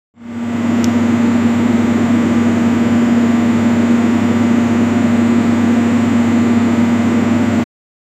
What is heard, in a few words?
the sound of a large fan spinning with a whoosh